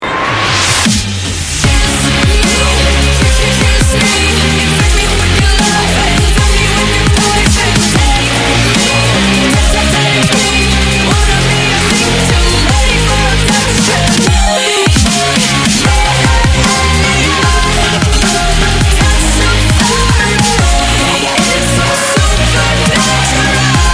dubstep remix

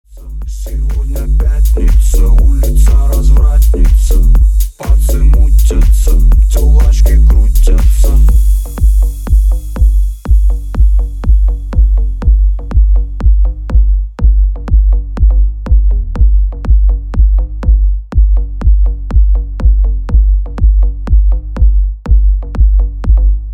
bass music soul

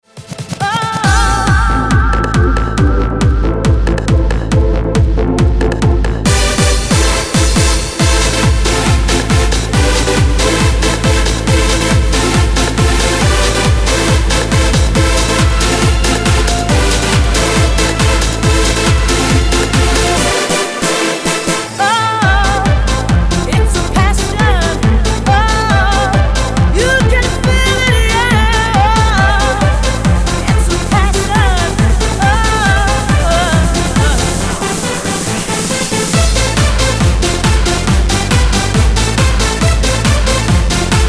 techno mix

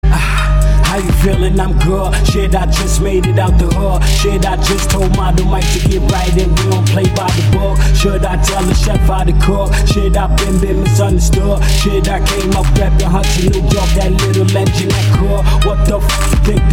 trap mix

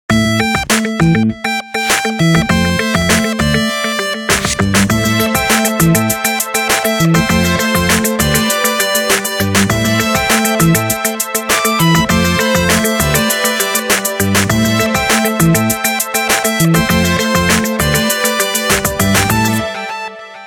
sony ericsson dawn

